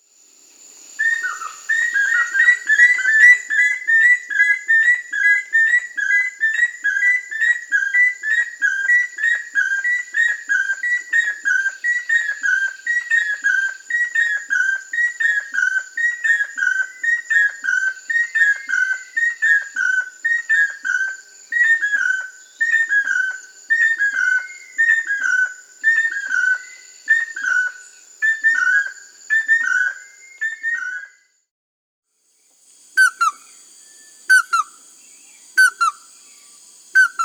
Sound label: Chestnut-headed Crake, Anurolimnas, Nature's Songs, S. America